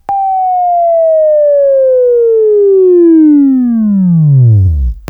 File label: Buzz